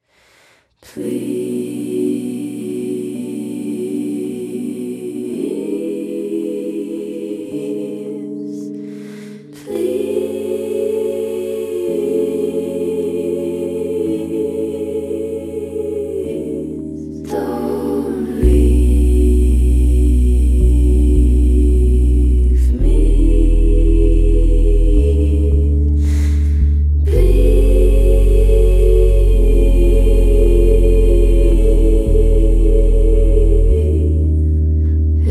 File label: cut